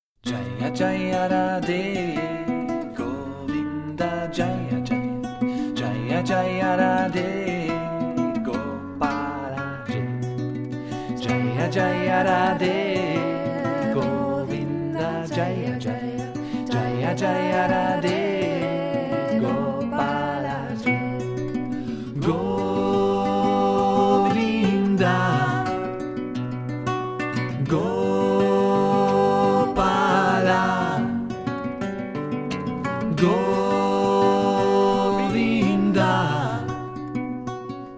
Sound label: Yopi bhajan